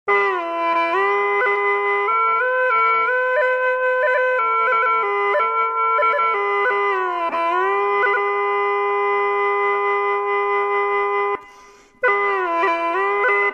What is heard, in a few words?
hulusi